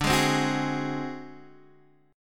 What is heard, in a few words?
D 7th Flat 5th D down Guitar Standard, chord; D7b5 strummed; JGuitar